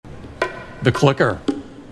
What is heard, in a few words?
The clicker